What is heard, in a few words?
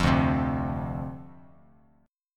D-Minor 7th, D, Bass Standard; x,8,0,5; Dm7 strummed